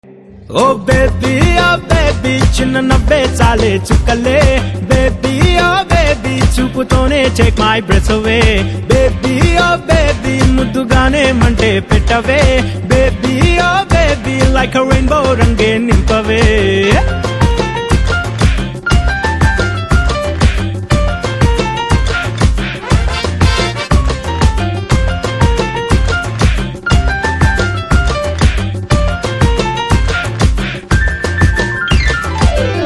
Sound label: Ringtone